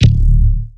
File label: bass grunt; Polyend; Recue